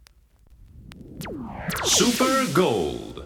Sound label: jinglepackage; topformat LP jinglepackage 2